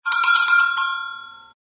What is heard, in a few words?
gong tiny